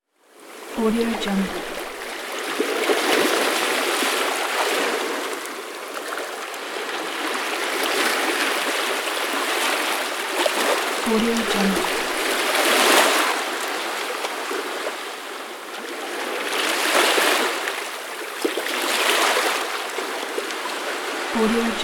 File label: sea waves